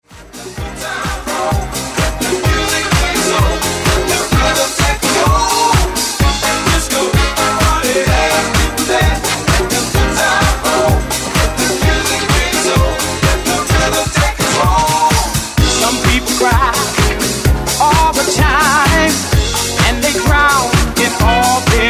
Disco Party

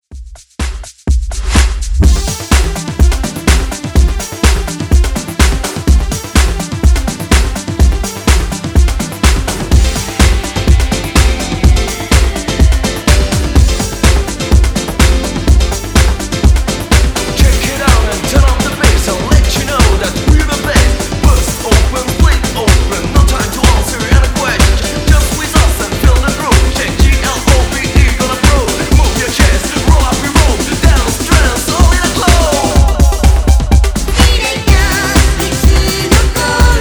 90s Pop Redrum

